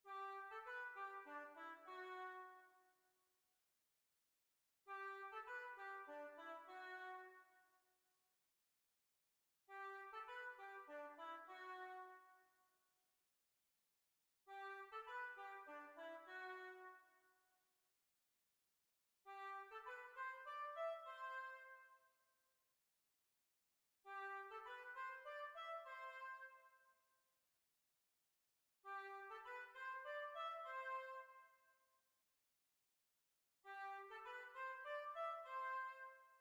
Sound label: German Spa